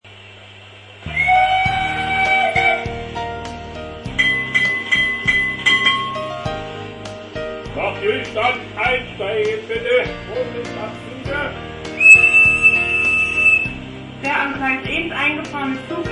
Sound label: Sächsische IK Dampflok, Saxonian IK Steam Loco